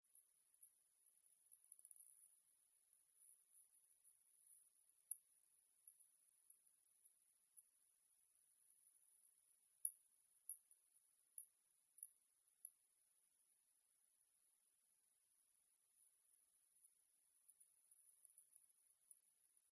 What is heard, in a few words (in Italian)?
Pipistrello